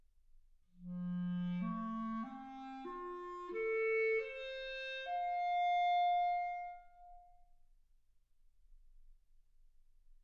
clarinet

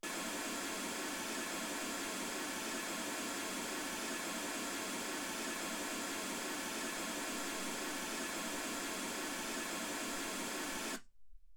mls16 48kHz; single mic

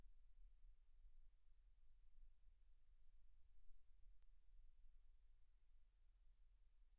silence 48kHz

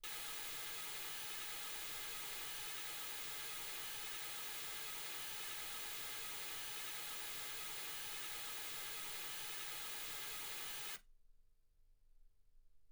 mls16 48kHz

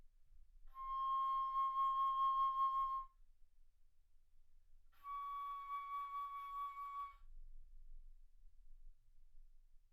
Bass Flute ff C6 Db6

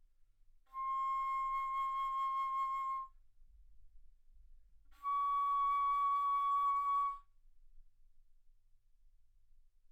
Bass Flute C6 Db6; ff

